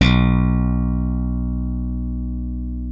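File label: left thumb B1, slap thumb; Xsample VOL-1; 90 s Sample CDs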